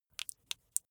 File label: flesh wet greasy squish